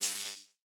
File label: shock; CrossCode; battle